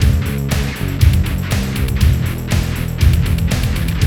FAT ROCK

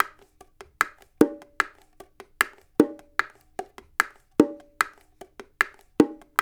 loop, percussion; InVision Interactive

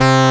SYN MC, MC-202; Sound & Vision - Gigapack I CD 2

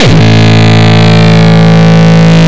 Longkick, kicks

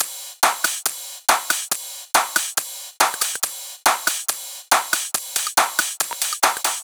drum Loop 140BPM Full

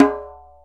Djembe Tin, drum machines; E-MU Planet-Earth

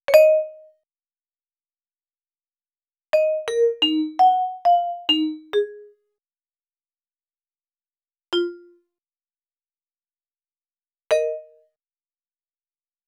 Vibes; Cinematic Funk; Sonic Foundry (Sony Creative Software); Cinematic Funk Urban Tone Poems

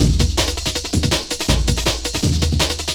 breaks 162

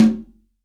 CONGA, STEREO CONGA

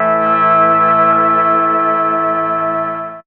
DR. LOOP, TRANCE; X-Static Goldmine 3

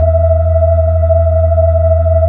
B-3, Hollow

